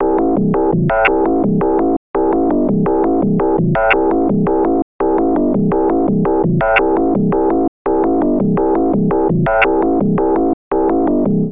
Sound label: Blunted style synth loop